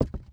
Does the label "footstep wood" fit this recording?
Yes